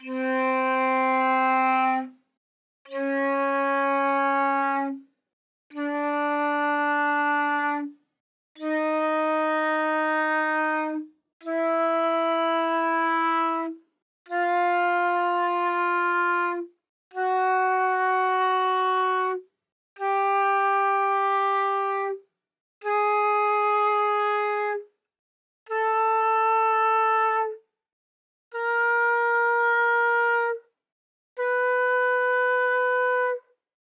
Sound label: flute novib; uiowa